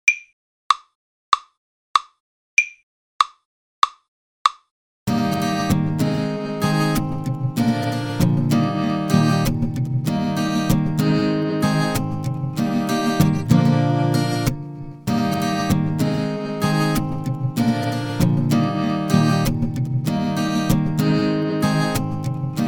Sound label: Kit Solo E